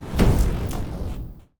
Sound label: spell shock throw; player